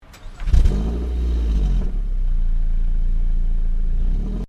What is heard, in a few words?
Car Ford Focus Ignition Exhaust; Ford Focus hire car; Cars; Vehicles